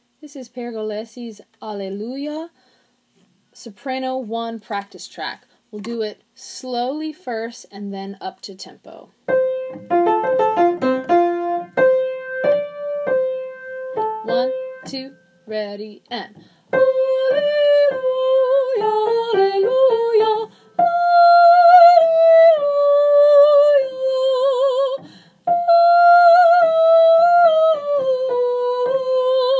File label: Soprano; Pergolesi; Alleluia